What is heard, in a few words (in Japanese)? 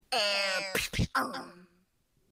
弓矢